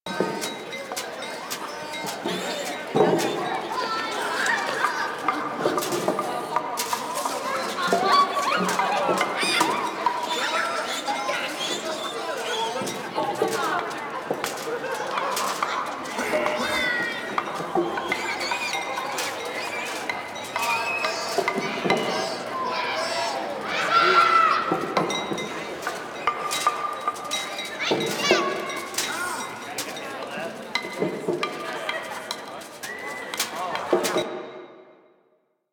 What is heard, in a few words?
Ambient Loop A, Village Exterior; Warframe; Ambience; Eidolon Plains; Ostron Village